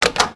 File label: coke machine button; Max Payne